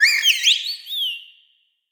creature sea monkey gimme

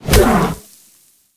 creature pinnacarid flinch land

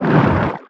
creature push; pinnacarid

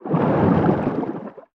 creature chelicerate swim fast

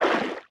creature baby penguin swim slow

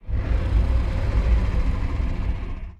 creature ice worm idle inhale

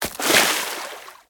creature baby penguin jump to water

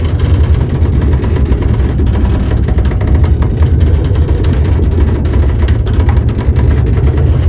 creature chelicerate exo attack loop layer metal

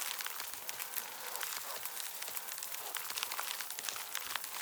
creature rockpuncher walk squishy loop

